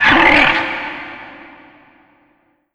vent screech, headcrab reviver, creatures

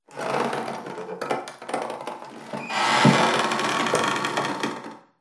wood, ambient; Half Life Alyx

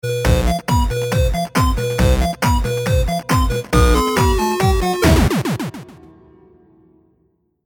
lost round, music

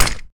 grenade hit, flashbang; weapons; Counter Strike Model Pack; CS2

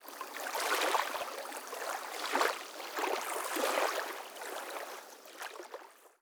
river lap; ambient; anubis

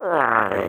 mannequin grunt; headcrab classic, scripted; creatures

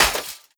break metal cocktail shaker; physics; breaking

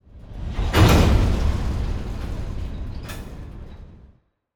train take up; ambient

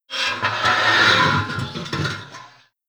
chair slide; events; Portal 2

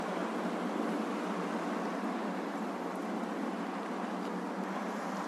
wind

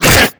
attack grunt, headcrab black; creatures; vox

